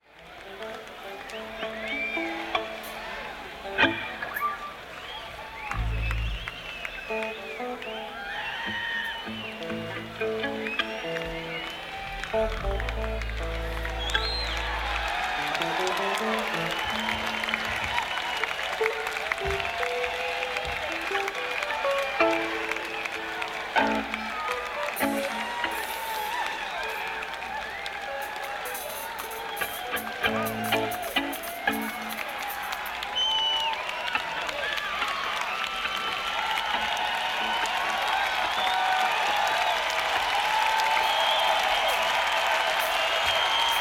Crowd Tuning; senn421

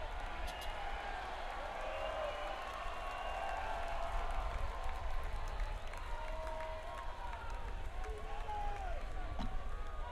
crowd; akg568ebs

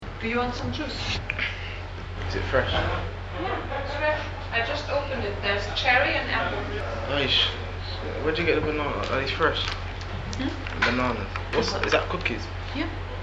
Jingle; Dans Fresh Jingle

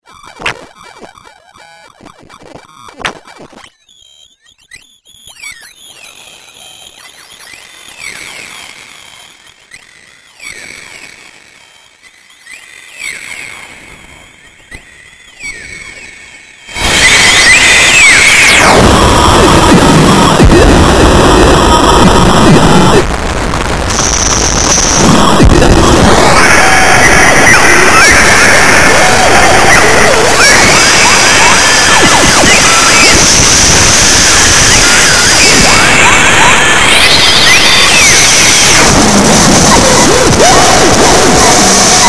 noise in harmony

